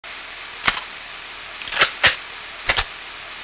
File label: reload, weapons; thanatos3